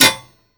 bullet metal solid, physics

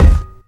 physics cube sm; Portal 2

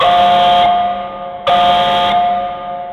container alarm; ambient; Portal 2

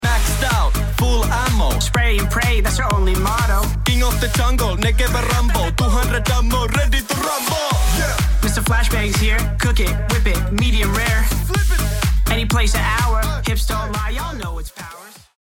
start action, music

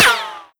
npc armor, bullet impacts; Half Life Model Pack

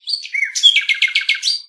nightingale; animal

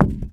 rock impact soft, concrete; physics

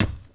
glass, footsteps; player

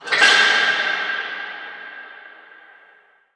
metal

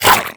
grunt, headcrab, creatures; headcrab classic; vox

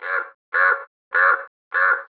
crow; Half Life Model Pack; Half Life 2

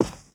carpet, footsteps; player

